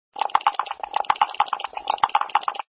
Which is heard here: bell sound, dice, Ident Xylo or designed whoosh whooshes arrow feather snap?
dice